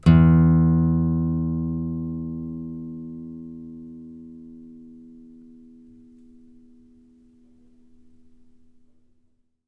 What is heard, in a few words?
bass, ostinato bass